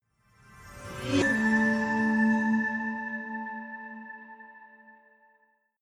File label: promo pad